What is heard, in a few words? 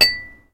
Impact glass medium